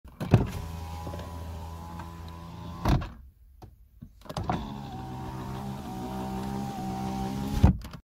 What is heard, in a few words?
car window, بسته شدن شیشه ماشین